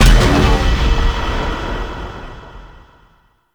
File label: Void Dragon Weakpoint Destroy; Enemies; Gameplay; Warframe; Duviri